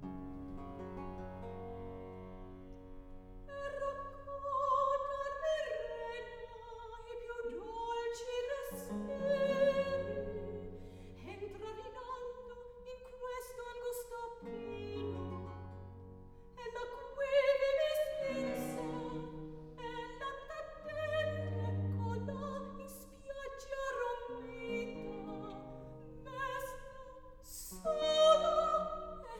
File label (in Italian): Recitativo; Act 2; Per raccor d'Almirena; Handel - Rinaldo